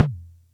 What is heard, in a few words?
Music Percussion Computer Tom, Drum Machines